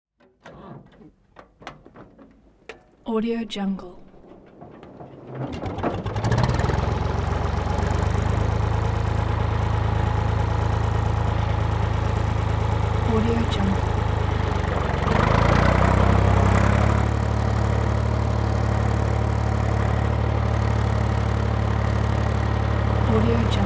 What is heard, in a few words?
tractor start idle